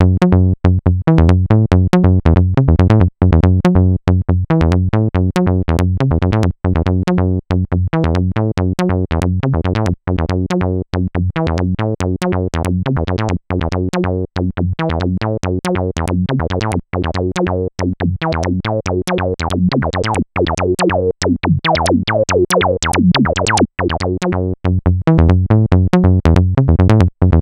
bassline MOTM 440

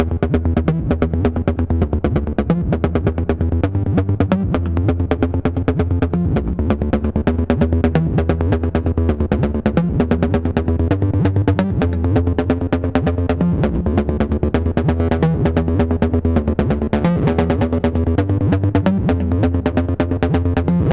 VB-303 quick seq

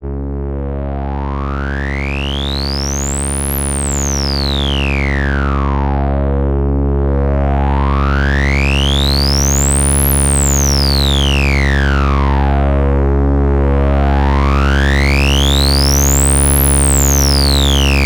distortion test; boss rx-100

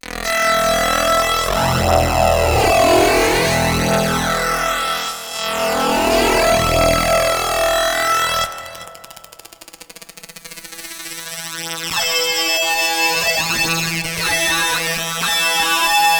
pulser xor

